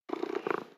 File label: Pet Cat Purr; creatures pets; Lego